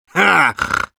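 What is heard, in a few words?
laugh short, Russian; Medic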